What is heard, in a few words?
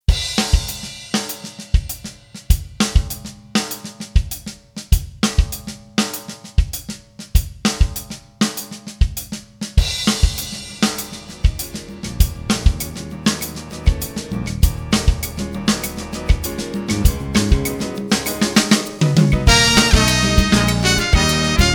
afrocuban